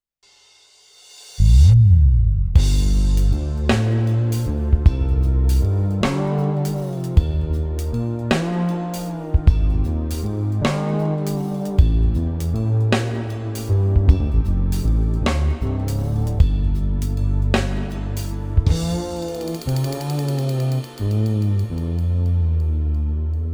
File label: bass and drums; xp-80; roland; Multi-patch demo; roland demo cd-3